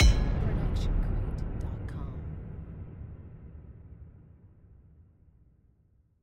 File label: Epic Metal Hit; soundscrate